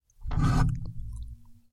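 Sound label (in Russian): metallicheskii predmet skolzit ne carapaetsya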